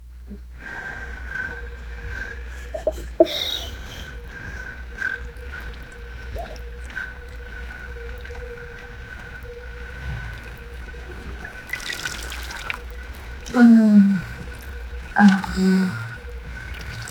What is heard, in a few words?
steady stream of urinatin, bathroom